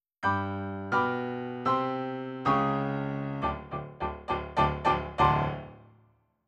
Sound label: RnR Piano